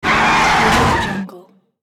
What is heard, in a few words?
car brake skid and crash